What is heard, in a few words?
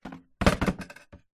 wood box small set down; foley; zapsplat